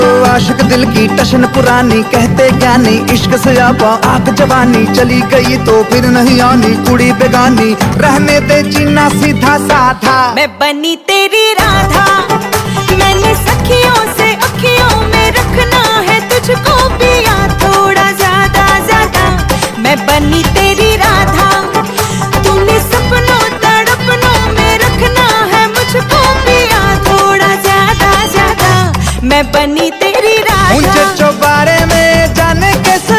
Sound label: Purani Bollywood Ringtone